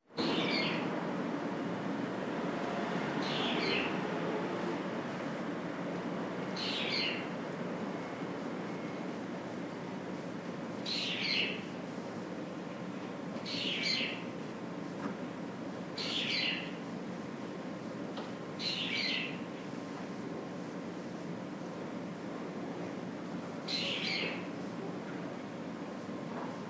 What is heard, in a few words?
brown eared bulbul